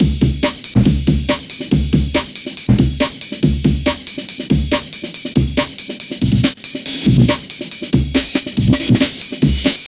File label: high quality amen break, breakbeat